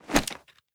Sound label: punch gun miss; gmod; arccw uc commons attachments